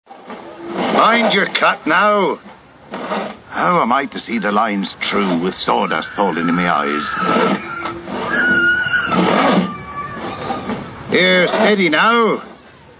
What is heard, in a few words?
sawmill pit saw; blackgangchine